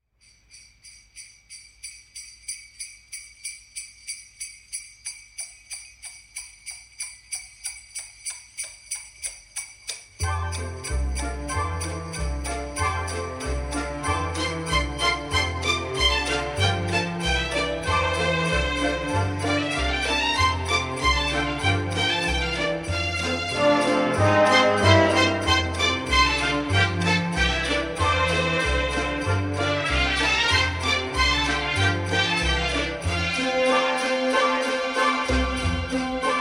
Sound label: Jingle Bells Medley